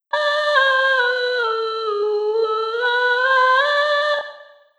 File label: soprano Bruite